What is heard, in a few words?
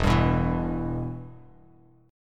E-Minor, Bass Standard; strummed; JGuitar